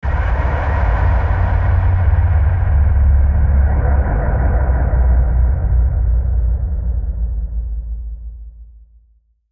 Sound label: static burst; Static Burst Low Dark Phaser Delay; Production Element Imaging Element Accent Transition; Blastwave